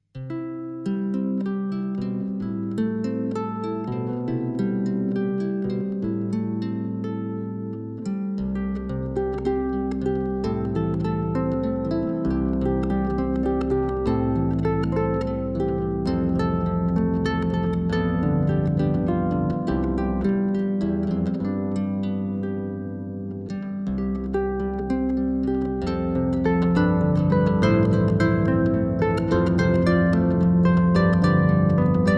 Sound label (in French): Harpe